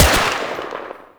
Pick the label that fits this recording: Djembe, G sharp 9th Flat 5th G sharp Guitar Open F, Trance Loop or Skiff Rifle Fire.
Skiff Rifle Fire